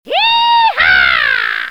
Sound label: Yehaw, Paroles; bruitage